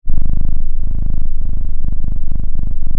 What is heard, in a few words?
pop, Sound of a cat purring